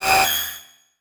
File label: Title Transition Crystal Shatter; Adobe; Production Element